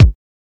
small reverb kick drum single shot a sharp key

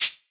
good shaker sound sample c key; percussion

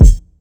punchy bass drum single shot g sharp key

